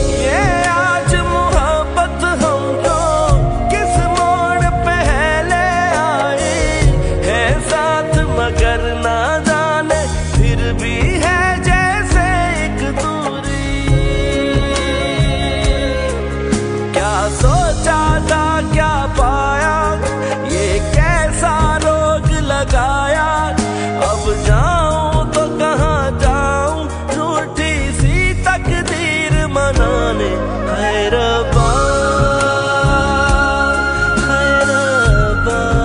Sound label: Sad Ringtone